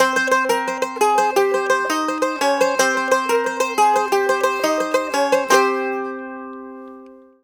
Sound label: Mediteranean Traditions; USB Soundscan vol.53; AKAI